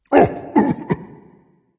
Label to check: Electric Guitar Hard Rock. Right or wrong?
Wrong